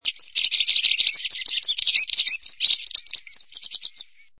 pied wagtail

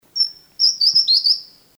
Pygmy Wren Babbler; Pnoepyga pusilla; song

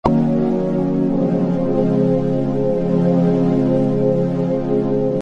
melody

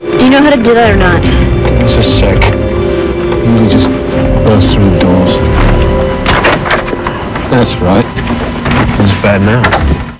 burst; Episode - Bloodties

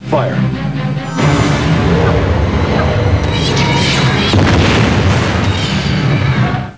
Fire; SHERIDAN-fire

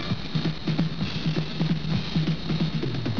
drum

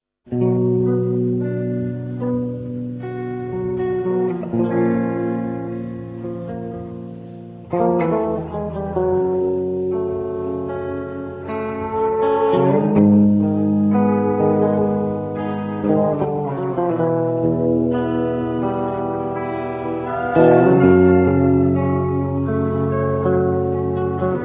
guitar

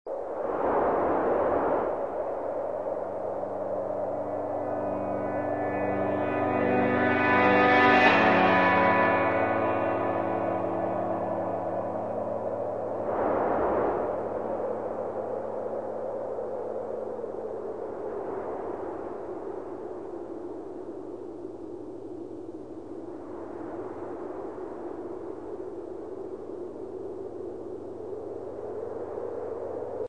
tones

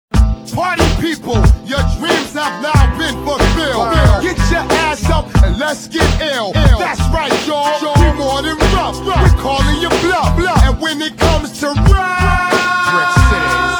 Oooh

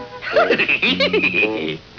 heehee

